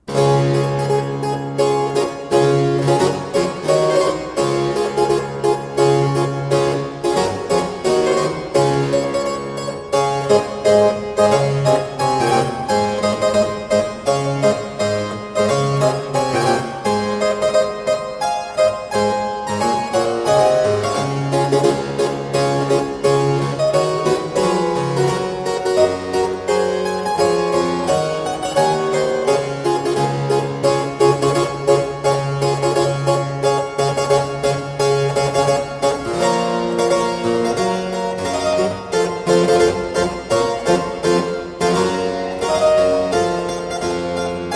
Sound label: Trumpet Overture - Entry, spoken, Keyboard Music; William Croft